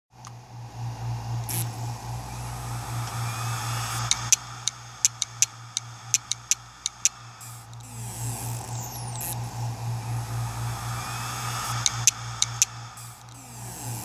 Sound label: Western Digital WD800 80GB